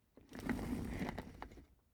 office chair trundle slow

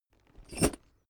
backpack metal single

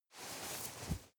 nylon leather very soft

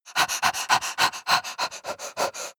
breath female pant fast

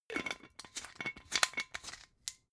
glass bottle massive close scratch

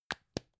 plastic spray can small close short

